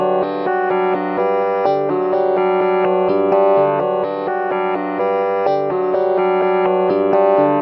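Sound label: angular mechanics, 126 bpm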